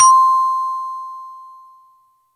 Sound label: Glocken M, Yamaha DTXpress misc voices